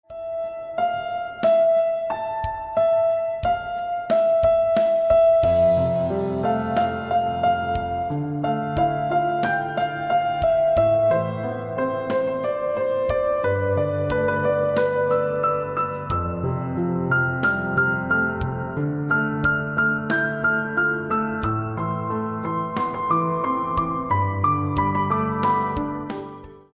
piano cover

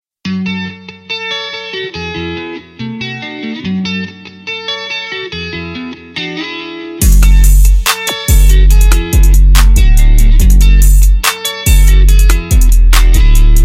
instrumental

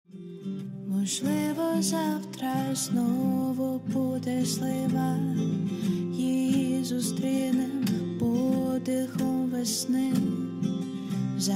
acoustic

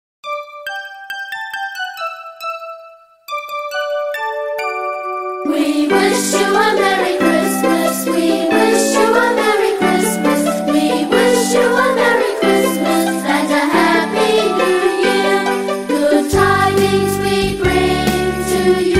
christmas choir we wish you a merry christmas

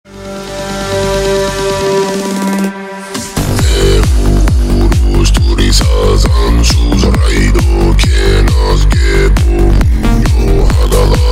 viking techno

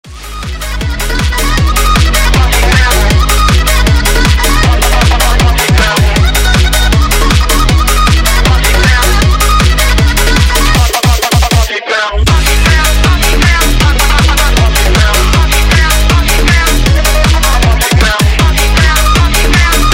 phonk

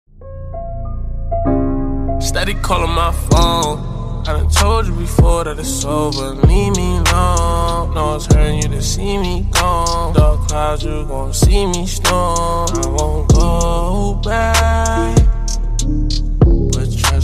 slowed reverb

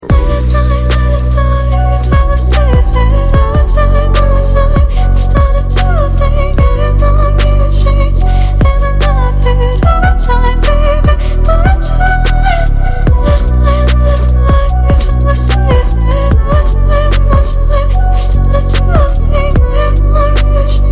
organ

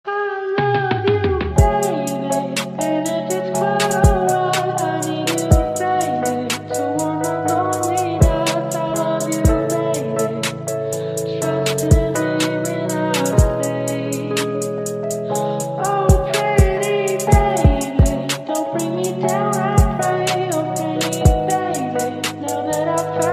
lofi remix

